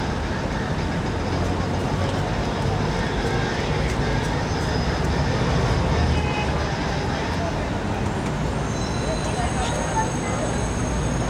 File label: traffic